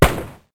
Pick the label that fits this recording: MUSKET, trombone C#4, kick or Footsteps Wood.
MUSKET